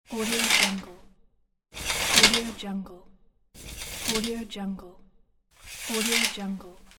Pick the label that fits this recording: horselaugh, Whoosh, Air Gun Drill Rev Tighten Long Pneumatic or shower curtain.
shower curtain